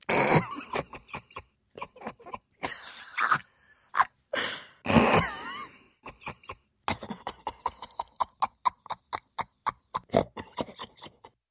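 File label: sounds of female laughter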